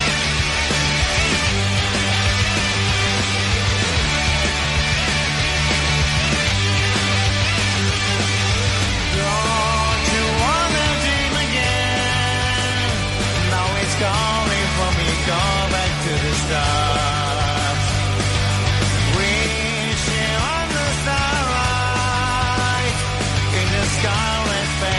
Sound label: theme, cartoon bgm; Dragon Ball Super